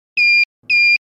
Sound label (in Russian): Ошибка 2 одиночных